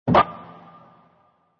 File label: pin